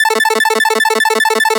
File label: 8Bit 150BPM 1 Bar Arp A; Antidote Advent